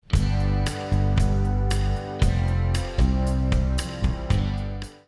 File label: bass